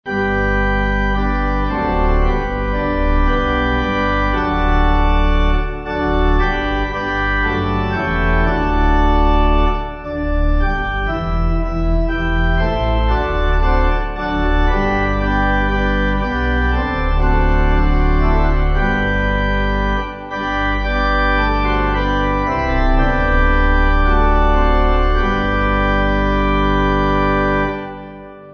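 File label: Pipe organ, church music backing; O For A Faith; Northfield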